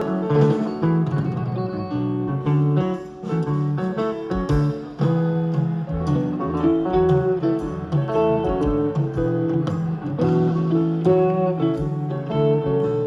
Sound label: soundcheck; shure sm89